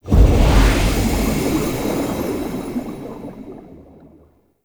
Transition Stinger Out; Cinematics; Midnight Oil; Warframe; Tau

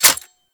Reload D A; Warframe; Weapons; Zylok Prime

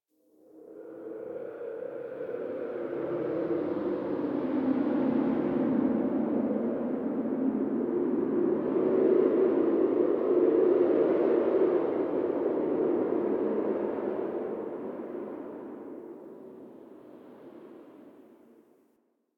Dark Wind

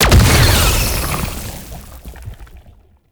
Infested Lich Summon Tentacles Slam; Warframe; Abilities; Zeke

